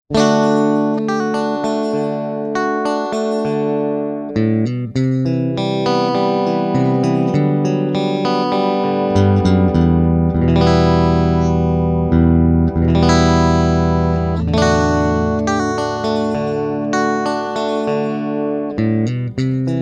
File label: Bridge PAF Neck Single Coil